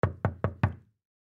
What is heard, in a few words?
Door Glass Knock; Office; Blastwave